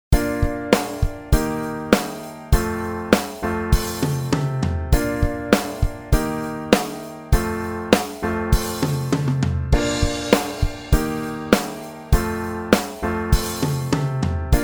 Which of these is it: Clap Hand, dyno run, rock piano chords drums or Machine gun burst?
rock piano chords drums